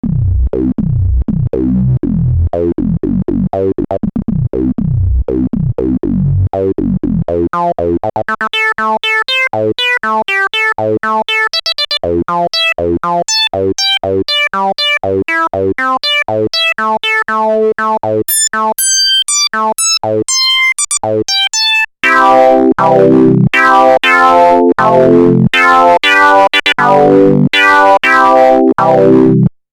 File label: clavia nord modular kb filter demo lpf24 lpf24